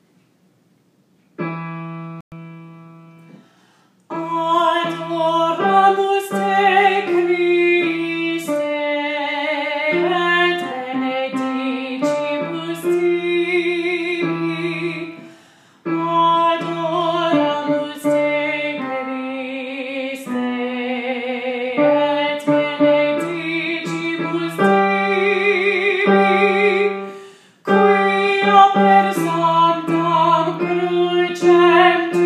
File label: alto, choir practice tracks; holy week choir practice tracks